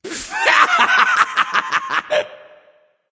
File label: laugh; Smite; Heaven S Rave Zeus